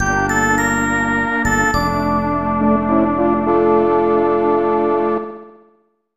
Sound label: Unused Fanfare; Gokinjo Bouken Tai; snes